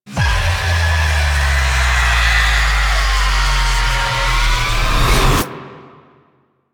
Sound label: Weave Boss Death; Warframe; Cephalon Weave